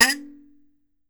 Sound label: KALIMBA; E-MU Producer Series Vol. 7 – Old World Instruments